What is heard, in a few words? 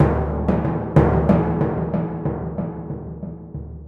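Timp, timpani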